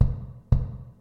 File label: bass drum; wave form